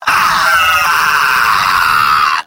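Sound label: scout pain critical death; vo; tf2; mvm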